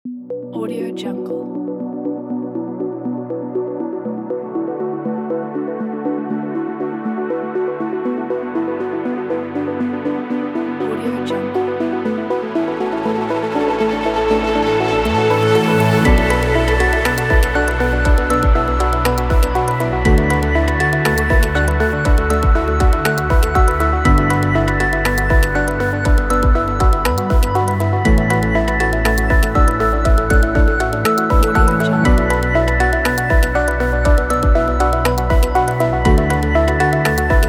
digital technology pack

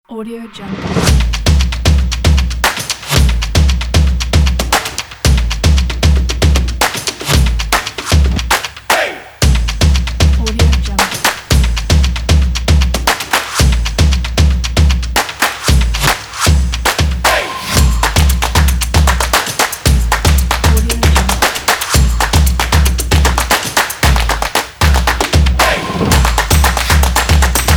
action drums

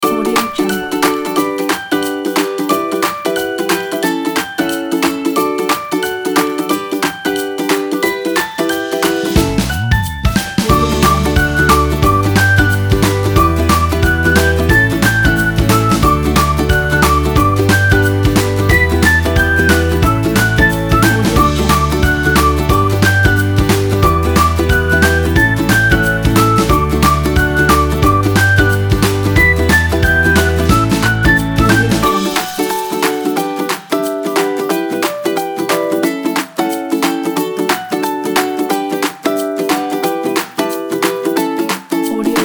happy music kit

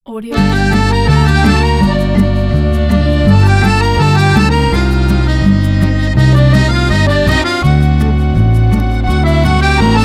french intro

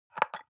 object bathroom soap dispenser press empty

